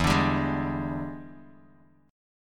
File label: E 7th Sharp 9th E down Bass Standard; E7#9 strummed; JGuitar